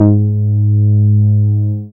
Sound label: BAS MINI, Mini-Moog